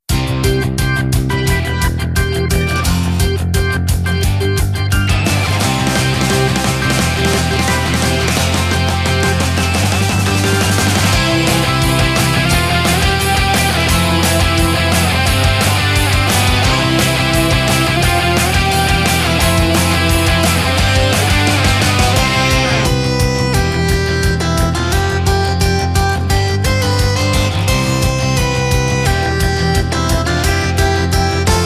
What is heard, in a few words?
Music event; Jikkyou Powerful Pro Yakyuu Success Special; PS Vita